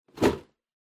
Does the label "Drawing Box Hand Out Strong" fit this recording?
Yes